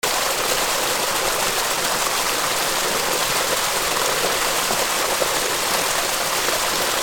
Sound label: WATER